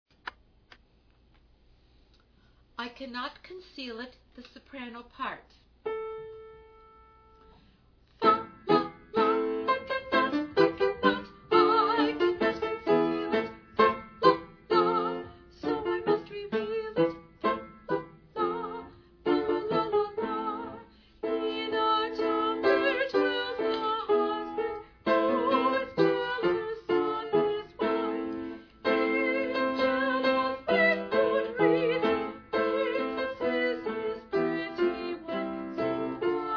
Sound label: SOPRANO